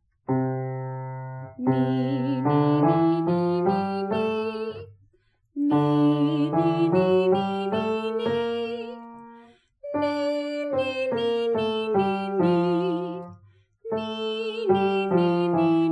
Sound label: Bass Baritone, Warmup Exercises